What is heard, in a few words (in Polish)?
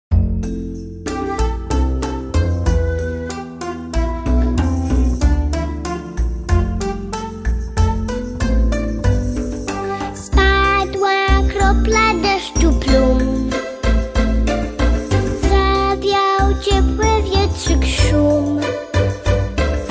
piosenka